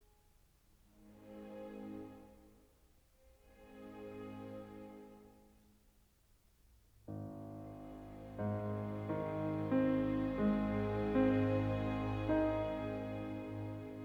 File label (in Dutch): Pianoconcert larghetto in F Minor